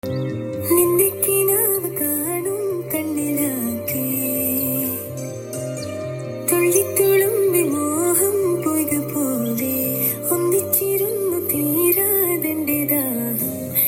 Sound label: malayalam song; aadujeevitham